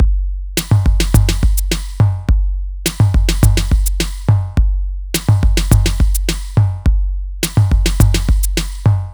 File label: Drums; LANDR Low